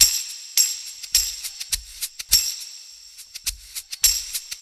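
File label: percussion, Roots Funk; USB Soundscan vol.41; AKAI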